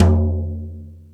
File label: Jazz Drums, E-MU Pro-Cussion